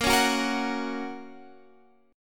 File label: A sharp Minor Sharp 5th, Guitar Drop D